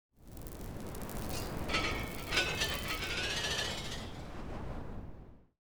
crunch zones 3D

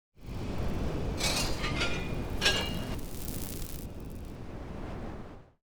crunch zones 3D

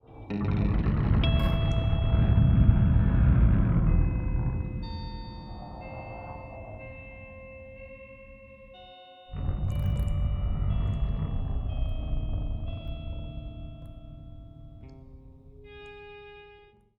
Guitar Piece; Scene 3

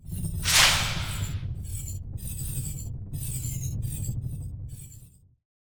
In Flight Whip Crack

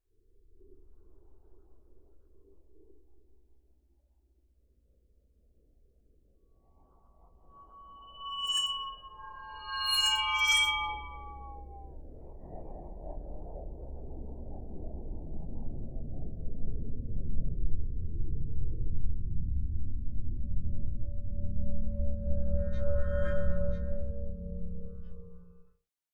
Inside Track Long Swirls

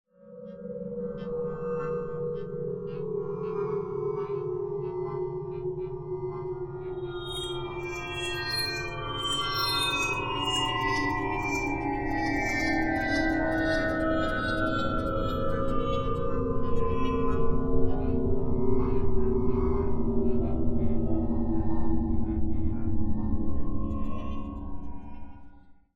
Inside Track Long Swirls

